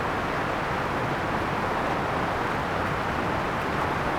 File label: Waterfall, ambience